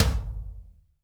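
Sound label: tom, drums; Best Service; Real Mega Drums VOL-1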